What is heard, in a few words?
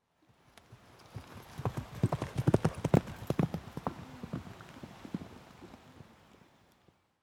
horse gallop on grass